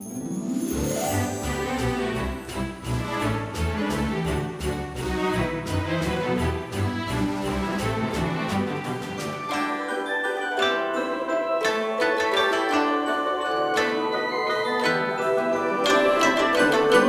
Christmas Menu; modern combat 5 blackout; android

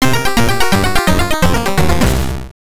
Race Lost; Wacky Races; GB

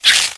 Scorpion Buzz Short; doodle jump 2; android

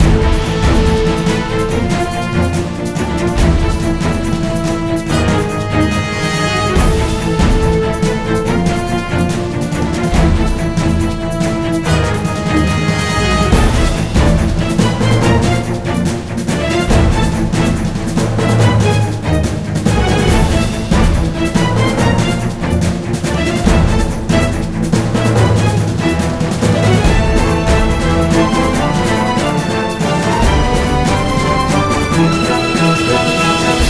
Battle Theme Boss; Kitaria Fables

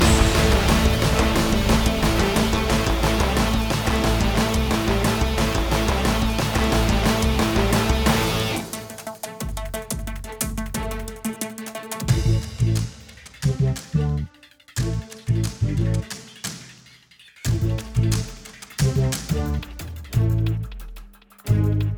Battle 2 Loop; bakugan champions of vestroia 2020; switch gamerip

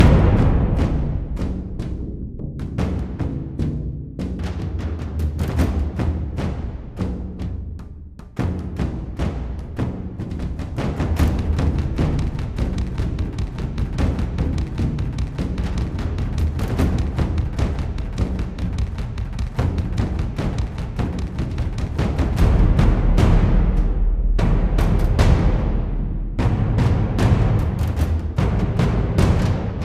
Drums Ambience; Assassin's Creed III

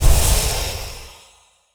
warrior quick attack

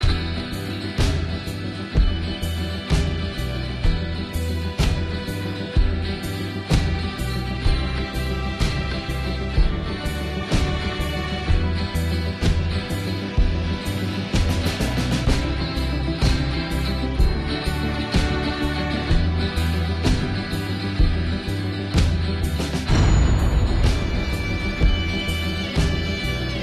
Action loop

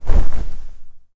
fall foley; Aragami

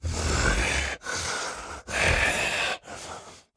Walk Cycle Burns; The Town

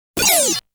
shooting sound

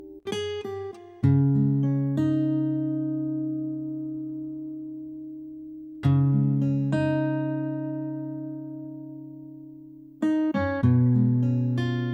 guitarbase loop 100bpm Eb Major; duet; chicory a colorful tale; ps4

